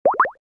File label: Bubble, notifications; Vivo X27 Pro